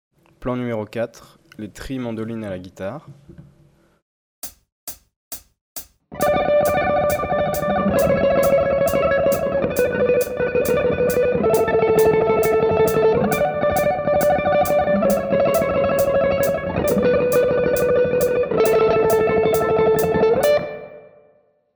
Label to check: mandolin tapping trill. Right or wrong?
Right